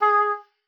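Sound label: G#4, oboe d'amore; Xsample VOL-7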